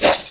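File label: SP-12, drum machine; Best Service Gigapack 2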